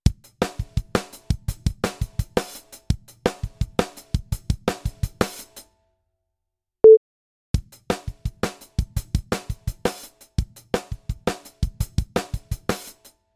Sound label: Drums GR 45dB Release